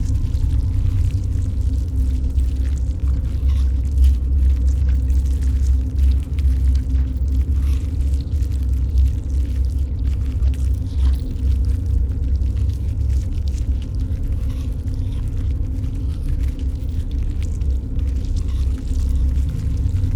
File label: ant ship mining station idle; Stellaris; biogenesis; ships